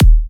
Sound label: Monster Kick, Drums, Kicks